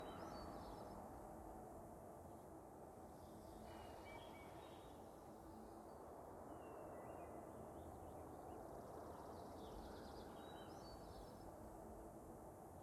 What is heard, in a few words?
ambience loop